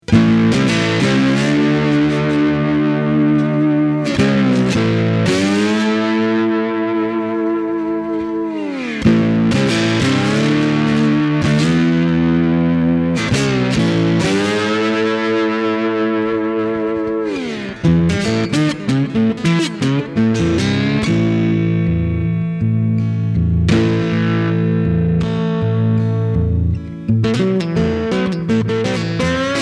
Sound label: fuzz; helios fuzz the one eyed turk; mercury fuzz sounds; effectrode